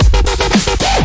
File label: 113 bpm dubstep gronk gronk whoop